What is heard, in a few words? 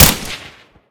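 g36 shot silenced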